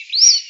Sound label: song